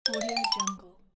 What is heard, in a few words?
marimba